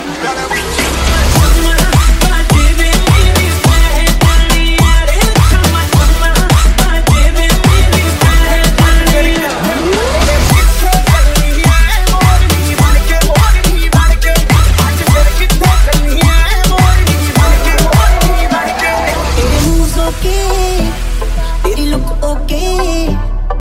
top bollywood ringtone; bollywood ringtones

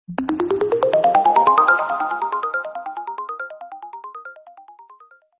classical message tone ringtone; samsung ringtones

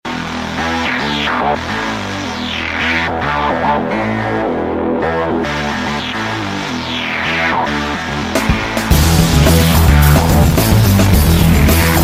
snowbeat ringtone

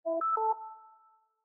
motoz droid message ringtone

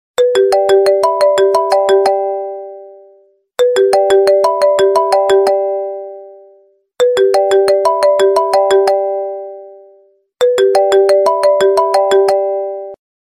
apple ring ringtone

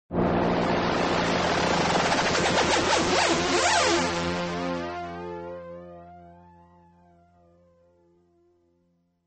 sms ringtone

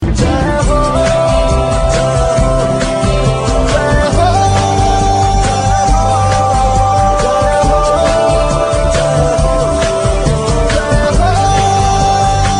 chorus

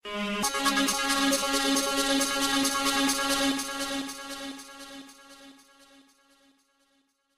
sms tone ringtone

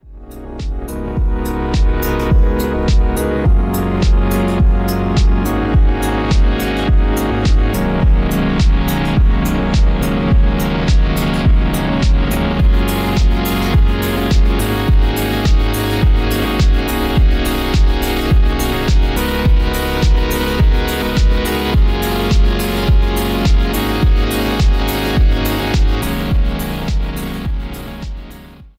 slow magical ringtone, galaxy

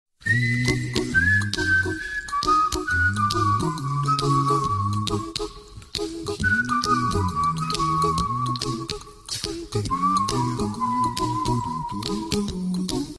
whistle tone ringtone

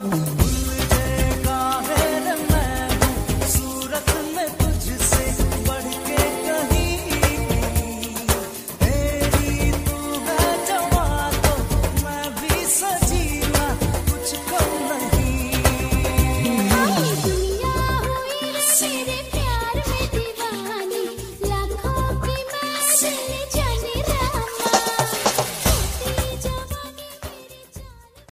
sweet music ringtone, bollywood ringtones

